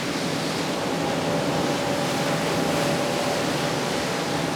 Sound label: ship move; Hearts of Iron IV Death or Dishonor